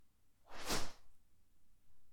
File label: Swishes Gentle